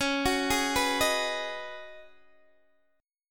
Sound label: C sharp 7th Suspended 2nd Suspended 4th C sharp down Guitar DADGAD